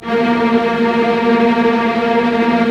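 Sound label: STR SEC, strings; Roland - LA Composer vol. 1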